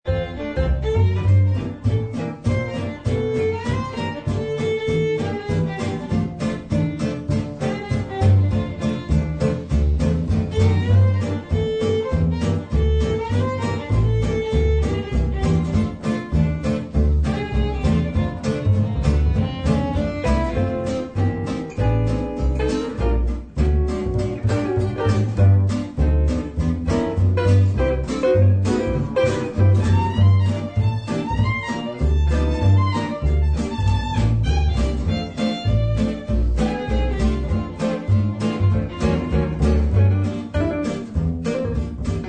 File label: Jazz